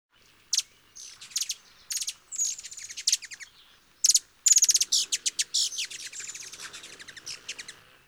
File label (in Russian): kolibri